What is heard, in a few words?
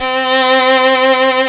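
STRING SECTION C2; AKAI S-Series CD-ROM Sound Library VOL-2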